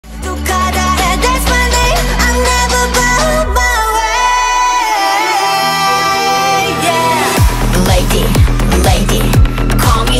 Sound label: korean ringtone